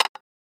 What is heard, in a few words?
placing a bottle on a glass shelf